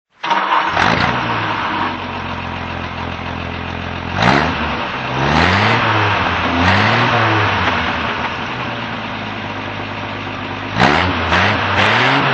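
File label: talbot lago t26 record coupe throttle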